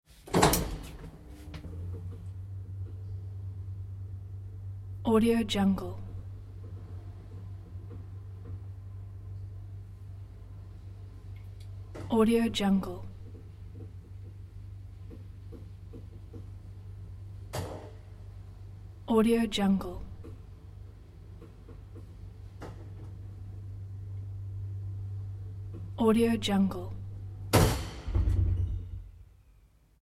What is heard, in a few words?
industrial lift italian vintage open cage ride; fiam